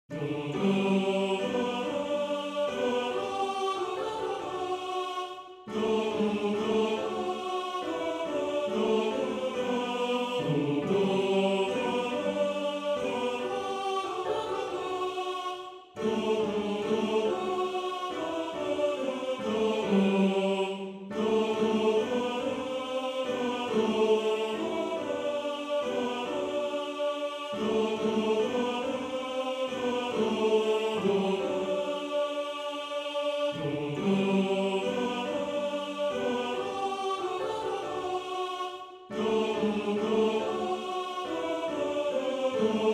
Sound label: Tenor; Schifferlied; Tenor 1